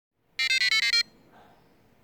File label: Melody